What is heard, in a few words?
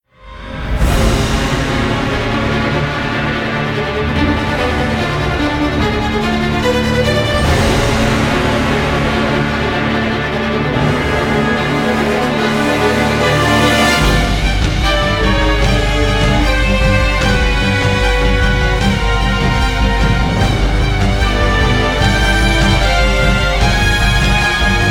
Bgm battle; dimensional destroyer